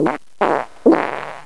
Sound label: fart